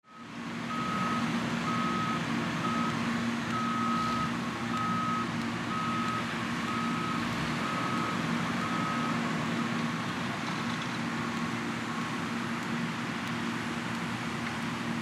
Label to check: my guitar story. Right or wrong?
Wrong